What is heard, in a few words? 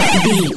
retro teleport warp effect